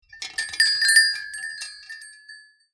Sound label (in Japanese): ドアチャイム